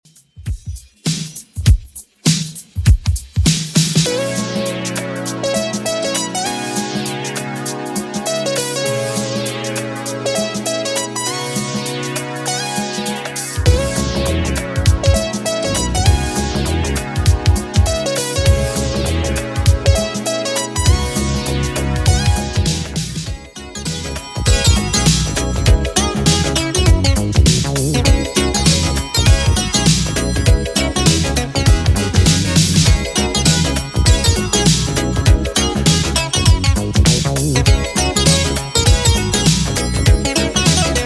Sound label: 80s Redrum 100bpm